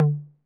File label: Tom Mid 808 Sat; Saturated